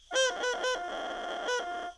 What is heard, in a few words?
Crow, bassoon reed; The width of the reed at the collar was 0.33 in (8.38 mm). The reed had a string wrap.; Ewell